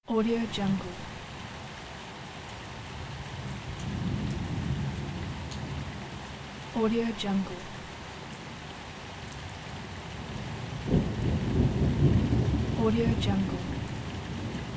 thunderstorm

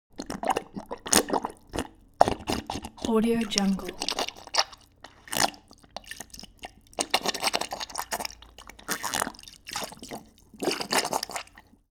animal dog drink bubbling; smartsoundfx

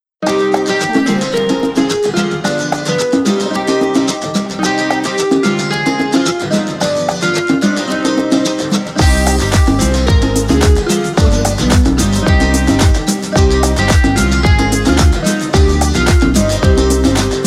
latin pop background

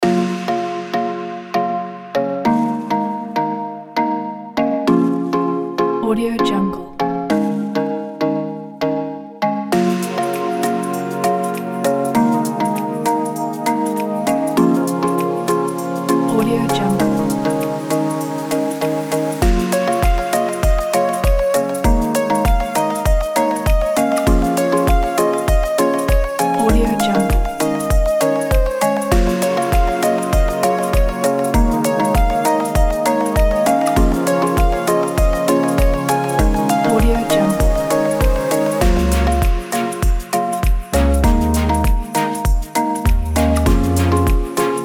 slow inspired background pop

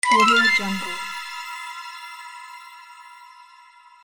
sms; 3dhome

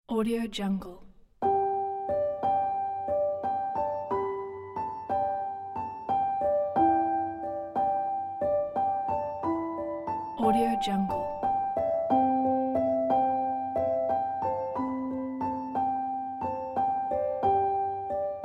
ethereal lights

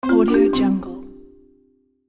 goodbye notification

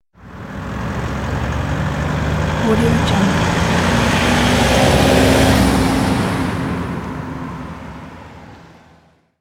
truck passing by

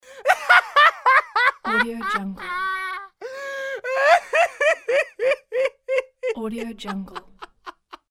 long joke laughter; fxprosound

